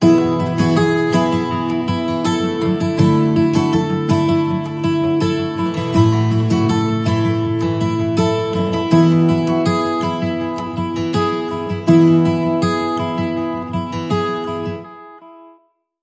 Positive Forward, No Drums

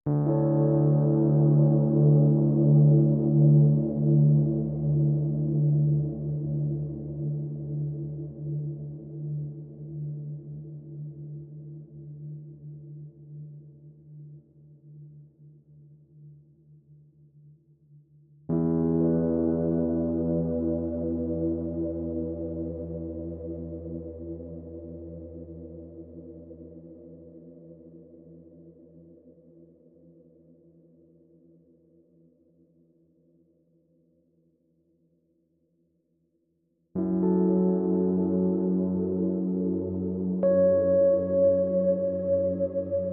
Rhodes Only

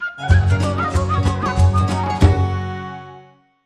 Hit

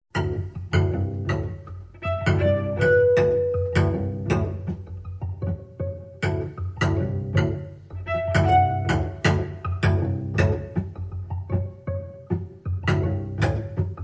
Cello Suite 2 Variation 3

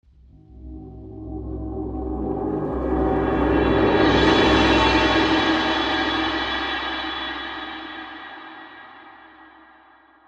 Long Crash Roll

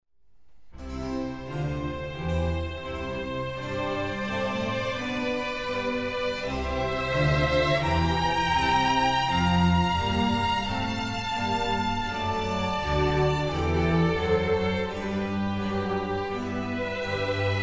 Concerto for Strings in C major; RV109; Antonio Vivaldi

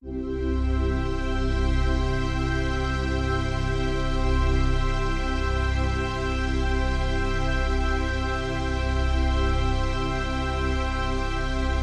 Just A Major Chord

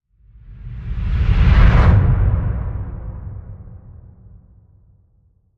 Dramatic Rumble Whoosh

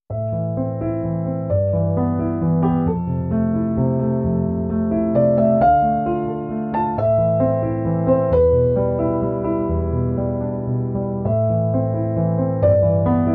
Piano Waves, Full

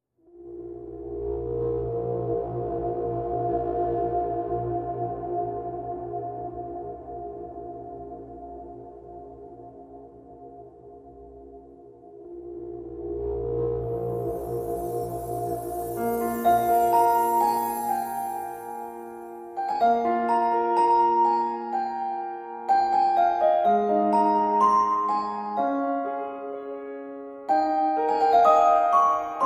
No Piano Mix

